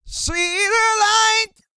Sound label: GOSPEL